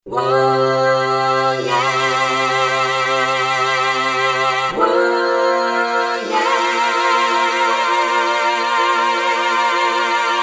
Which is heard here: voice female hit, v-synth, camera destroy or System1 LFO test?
v-synth